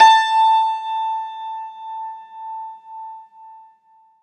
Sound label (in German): HACKBRETT A2; Alpine Volksmusik